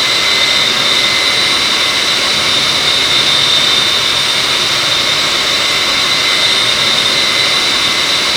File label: Aircrafts Engines Engine TF34 GE100 In R; DCS